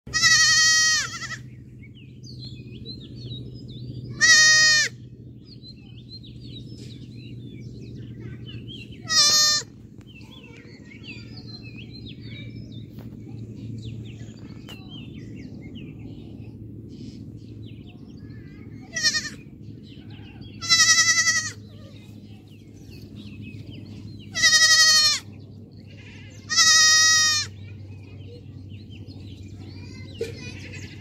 tieng de con con nho keu

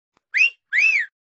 tieng huyt sao huyt gio huyt hiu

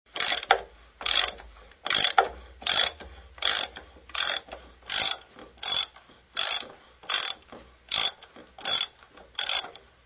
Tieng van oc vit

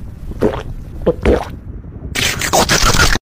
tieng nuot chung ngau nghien

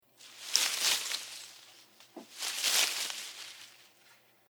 Tieng chui ra khoi bui cay keu xao xac

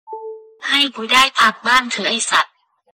am thanh thong bao sac pin

